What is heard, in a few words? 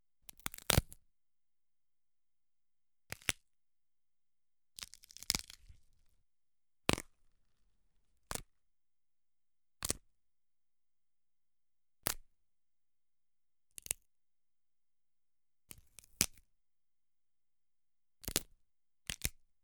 Bones Breaking; Scary Sounds; 1001 Sound Effects; Halloween Horror Sounds Effects